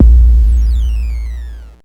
Anushri BD, Mutable-Instruments Anushry, Drum Machines